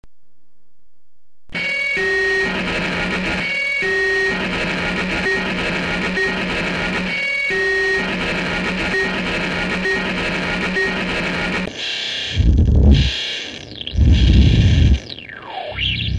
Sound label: Soundscape; ELH US BIG MUFF